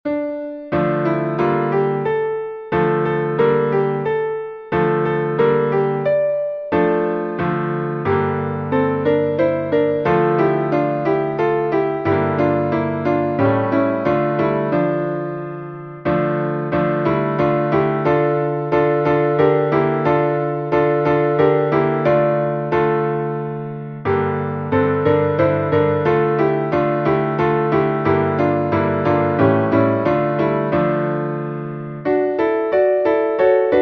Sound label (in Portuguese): salmo instrumental; salmos 137B; Melodia: A La Nanita Nana